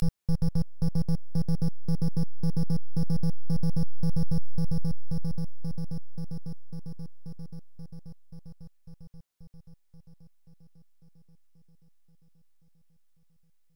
BGM; donkey kong; nes; 50M (Stage 2)

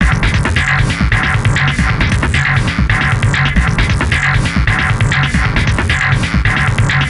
Alien Battle; Lego Battles 2009 NDS

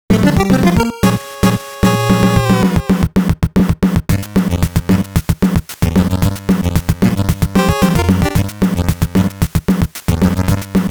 Tense Mood; Sunsoft

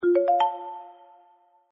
SFX Secret; yet another pixel dungeon android

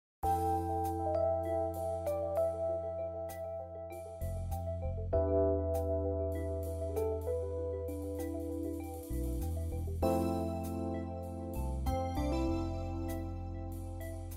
Romantic Event

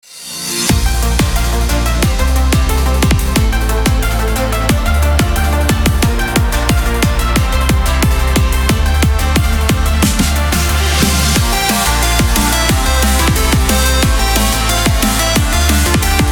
Battle Fast Hard; Lycan Sanctuary

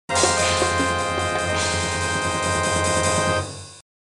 Death; Quest for Glory 3

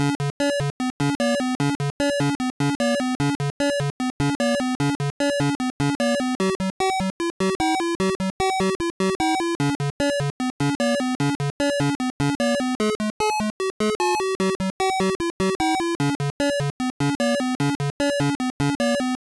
Oasis Bar Tune

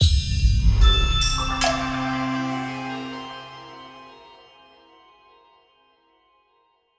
Sting

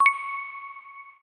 Blink

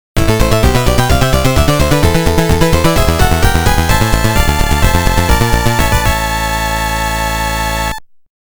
All Clear

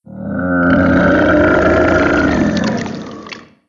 spawn boss; Bile Lord

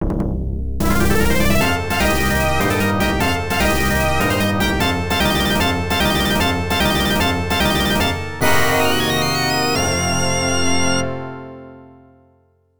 Complete Fanfare; Tank Force arcade

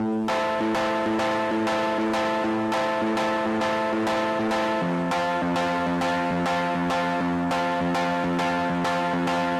Prom Destorded Piano; Remember Me

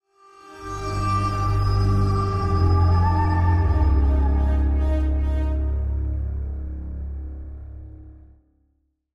Stinger Light; Angry Birds Star Wars 2; Crimson

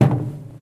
footsteps metal walk

